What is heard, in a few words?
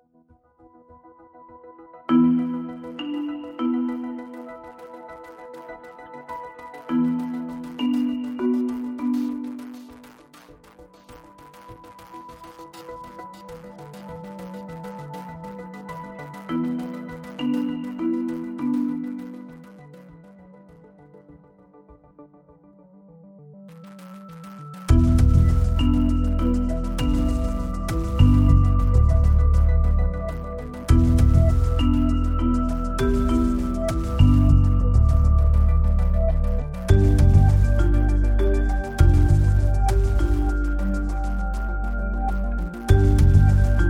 Business Marimbas